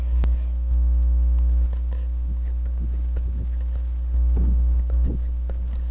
spoon

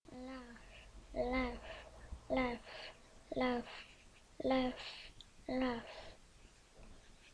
laugh

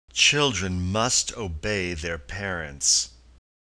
children

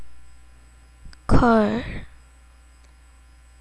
car

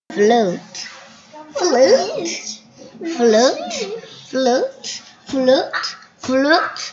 flute

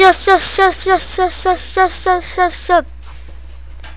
shut

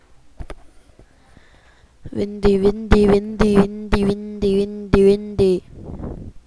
windy

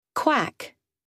quack

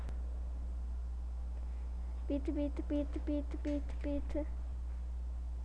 beat

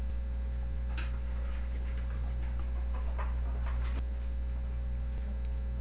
seashore

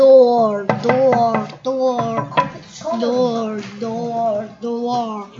door

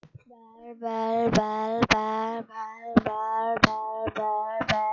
bell

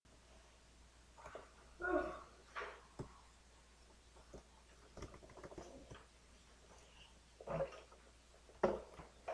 boar

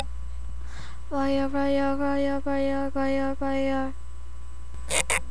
fire

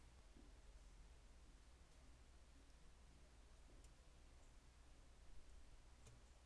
crowd

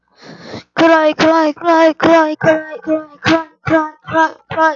cry

